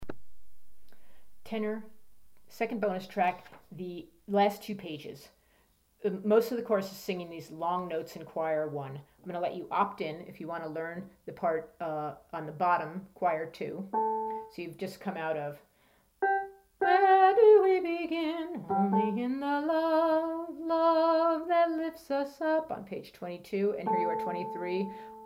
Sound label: tenor